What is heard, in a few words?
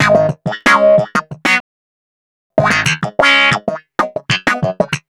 Wah Snap G# Rockin, Snap Bass; Basses